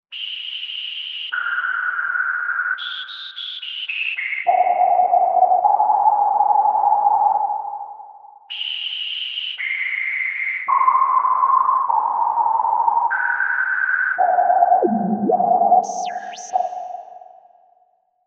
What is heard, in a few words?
Whistlewind